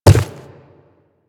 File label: Quadruped Medium Walk Dirt, footsteps; Warframe; Infested